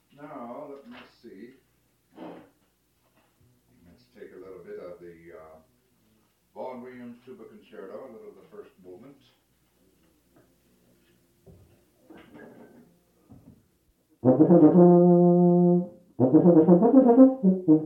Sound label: Concerto for Bass Tuba